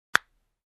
Finger Snap

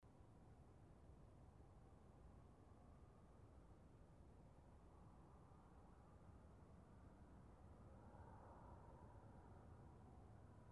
Car Driving by

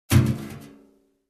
Empty Canister drop

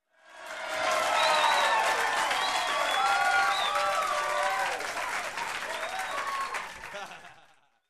Audience laughs and applause

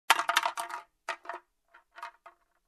Empty can roll

